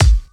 crisp steel kick drum G sharp key, steel kick drum sample tuned to the G# note; kick drum samples